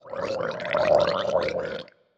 水中待机声; 溺尸

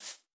竹笋击打声

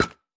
饰纹陶罐踩踏声

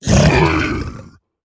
猪灵蛮兵死亡声

